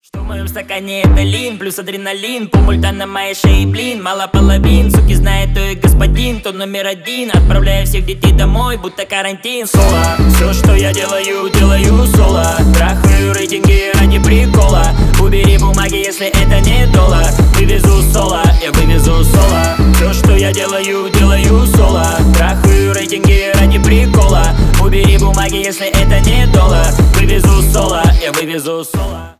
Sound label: solo